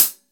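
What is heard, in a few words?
CLOSED HH, DRUM KITS; InVision Interactive; 40 Oz Phat Slammin Hip Hop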